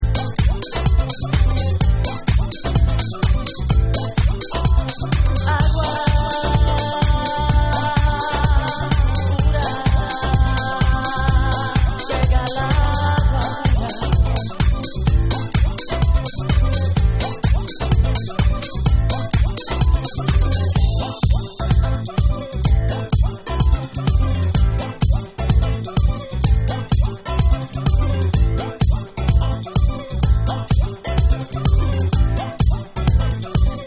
Latin Dub

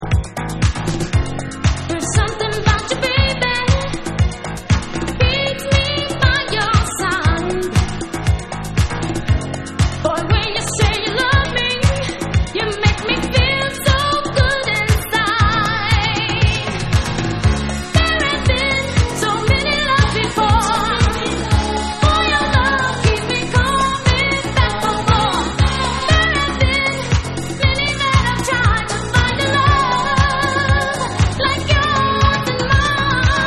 Lead Vocals

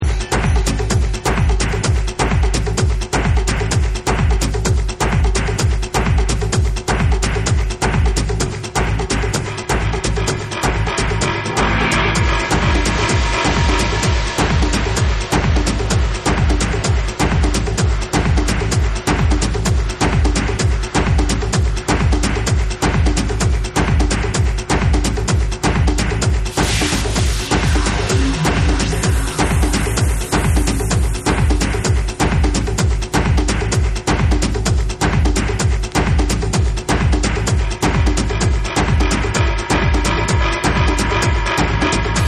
TRIBAL

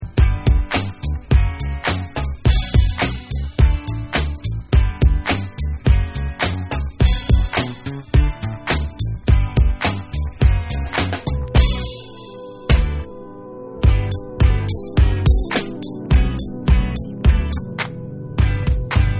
Instrumental

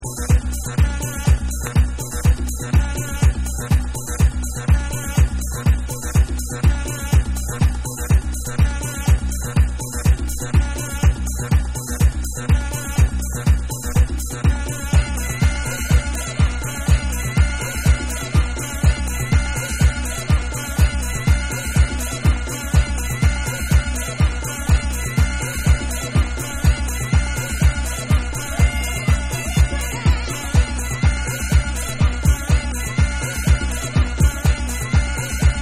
BLOW THE HORN

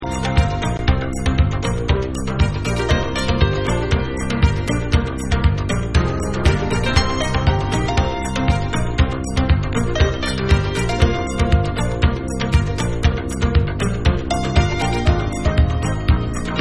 Instrumental Version

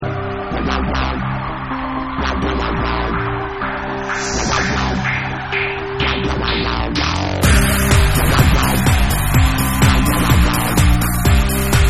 Dub

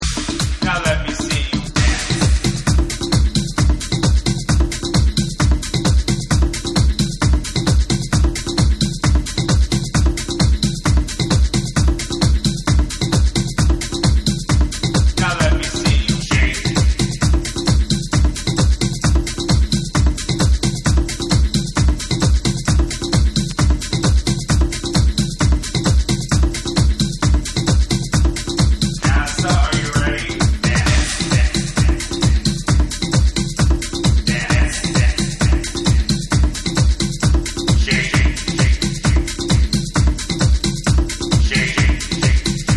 SPACE JAM Tribal Drums

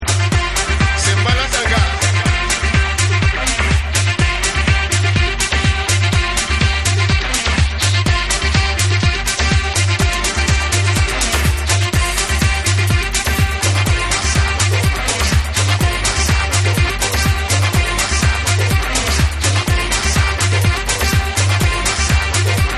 SOUL, Afro Shaker Mix

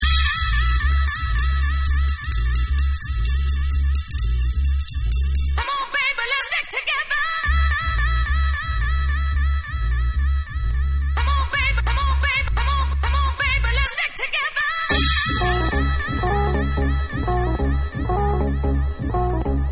Remix

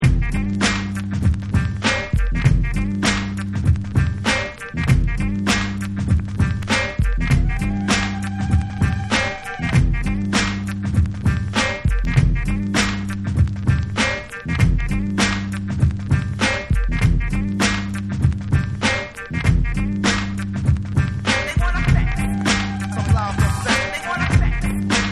Instrumental

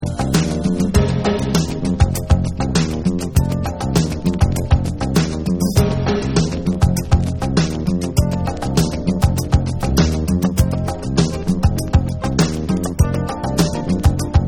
Instrumental

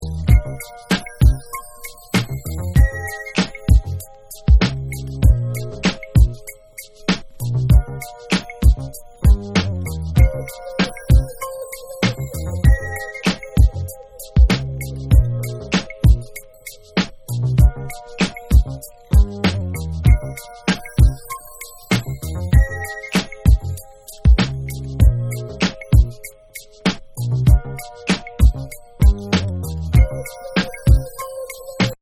Instrumental

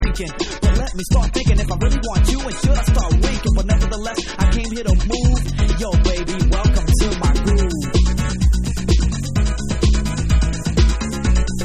Latin Hip House Mix

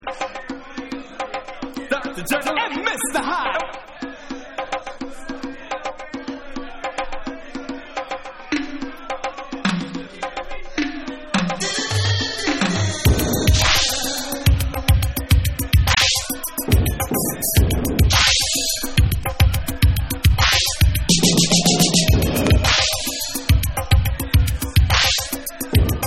Instrumental

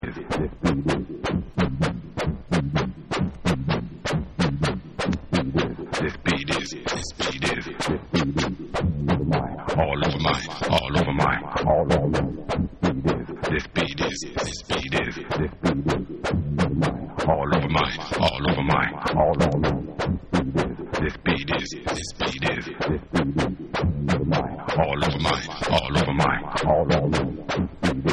THIS BEAT IS Accappella

